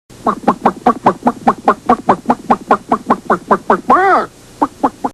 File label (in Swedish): kvack